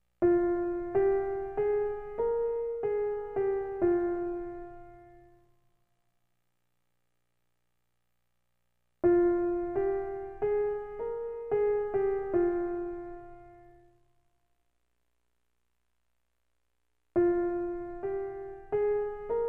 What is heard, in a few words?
Sadness Piano